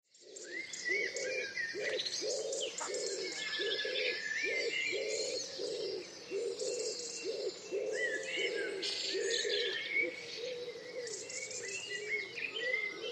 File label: ANIMALS-BIRD BGS RURAL ENGLAND: July, pigeons, blackbirds, jackdaws, roosters, movement